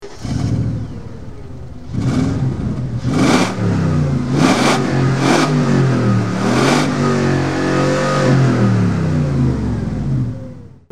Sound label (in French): Morgan - Aero8 - point mort